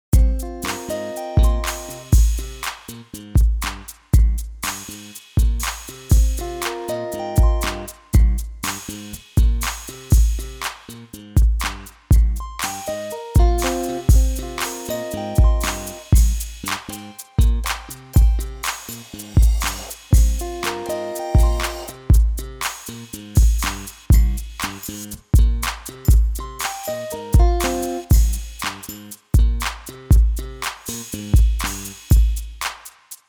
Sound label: MIDI ditty